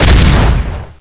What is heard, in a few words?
GRN BOOM